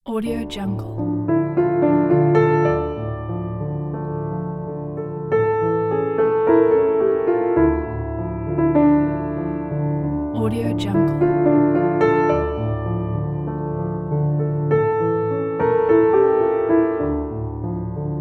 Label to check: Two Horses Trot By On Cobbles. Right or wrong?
Wrong